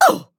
Grunt Hit; Realm Royale; Mage; Radon Flux

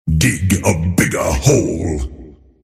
deny pain; earthshaker; arcana

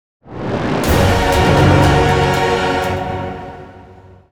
Stinger loot; dota2

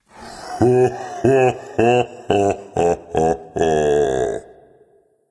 laugh; Beach Geb

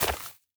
Nether sprouts break; minecraft

